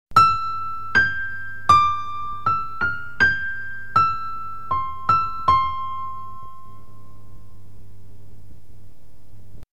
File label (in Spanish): Sonidos agudos